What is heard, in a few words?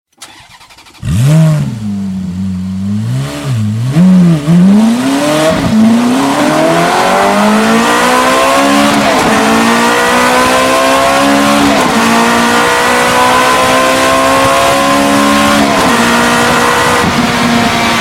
Ferrari Msg